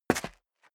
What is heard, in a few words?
step dress boots concrete grit single left; Footsteps; schritte